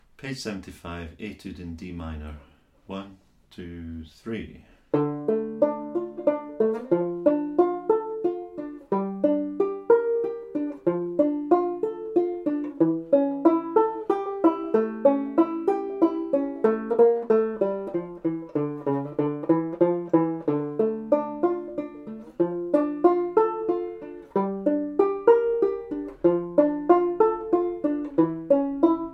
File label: etude in Dm; Bradbury Studies